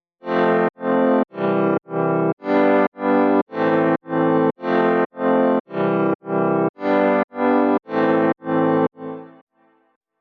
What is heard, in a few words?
Only Chord